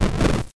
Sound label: Crumble